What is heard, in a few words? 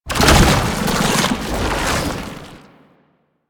Babau Turn Half, Foley; Proto Infested